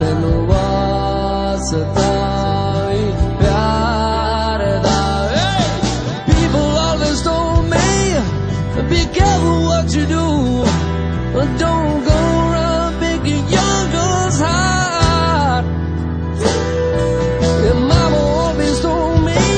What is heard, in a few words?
romantic english song ringtone